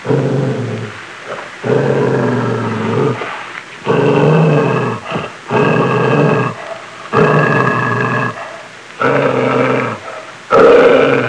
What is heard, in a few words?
growling; pets